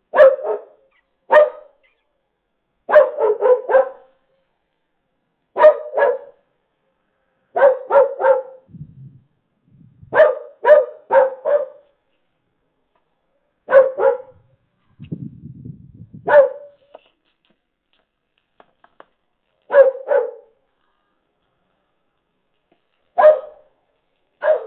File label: pets barking and meowing